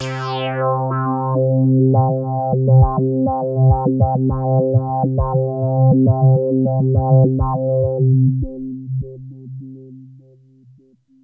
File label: Big Synth Basses